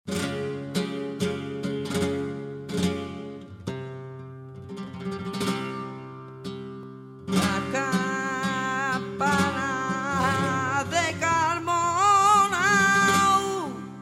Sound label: Solo Compas Tientos